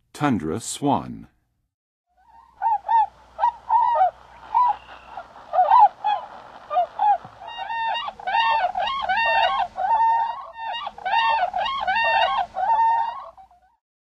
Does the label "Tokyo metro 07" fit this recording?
No